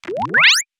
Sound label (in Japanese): クリック音｜デジタルA